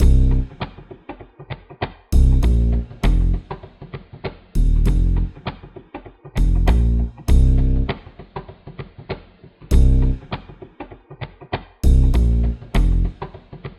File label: editor Loop; KSP; Squad